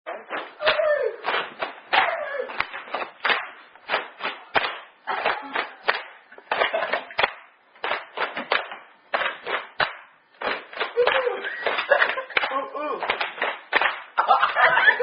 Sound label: Stomp Clap Game; May Be Related To Zoomy-Zoomy Drinking Game